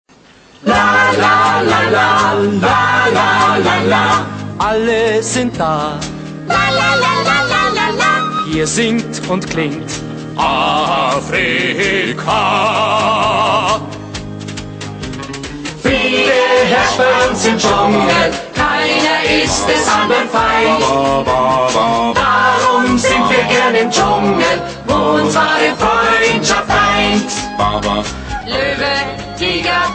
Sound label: jungle chorus; kimba 1977